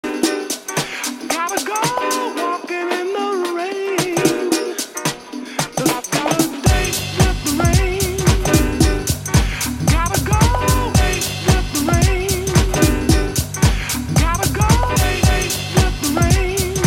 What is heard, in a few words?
bike, techno